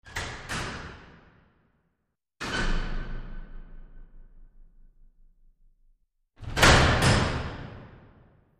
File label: Large Metal Door Slam, push bar